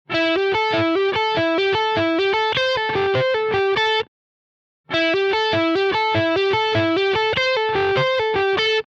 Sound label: Pentatonic Lick, guitar